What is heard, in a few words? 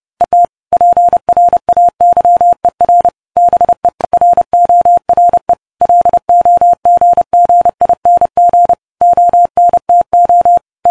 internet prayer morse